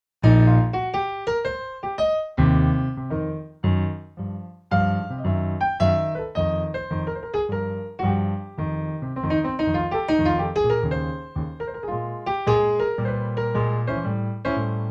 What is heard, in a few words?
Swinged Gar Rev